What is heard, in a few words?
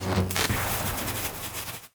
electricity